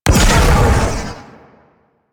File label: Heavy Cannon Fire; Vehicles; Crew Ships; Weapons; Warframe; Rail Jack; Corpus Crew Ship